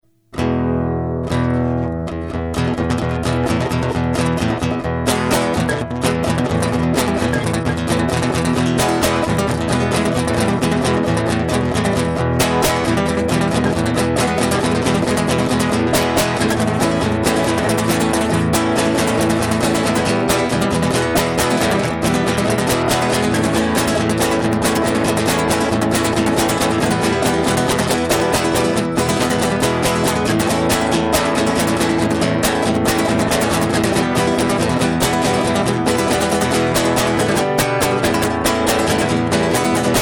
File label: guitar street jam